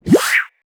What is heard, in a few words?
Menu Transiton In